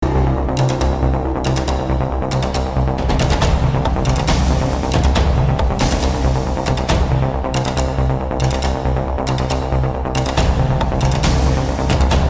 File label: intro music; commandoscout